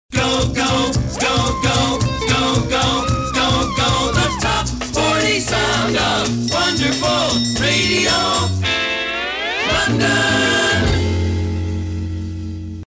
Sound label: Big L Jingle; Offshore Pirates; Wonderful Radio London